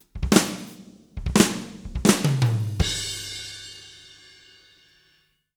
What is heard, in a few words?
Fills, Monster Beats; Zero-G